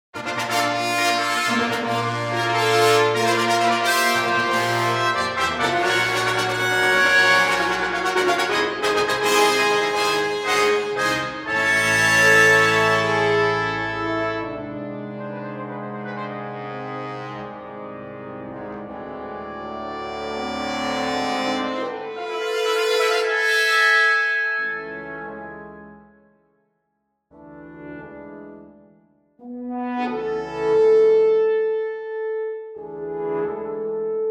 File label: fanfare; matt gates